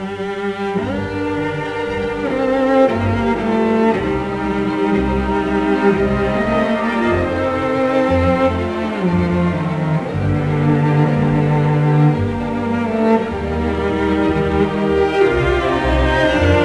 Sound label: cello con